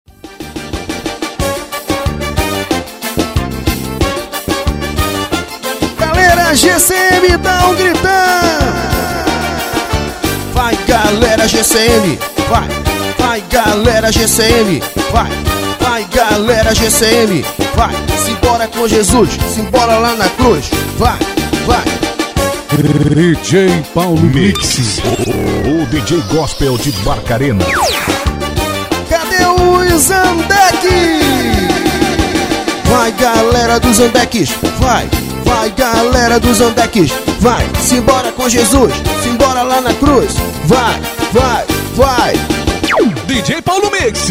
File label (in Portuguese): forro